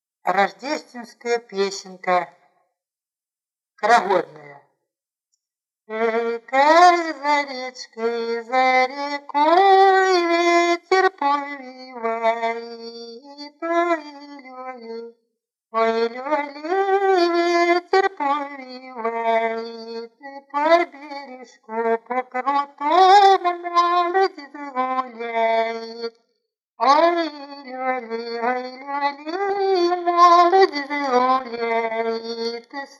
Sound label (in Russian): колядная хороводная; Эй, как за речкой, за рекой